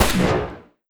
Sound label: sentry laser; snds jack gmod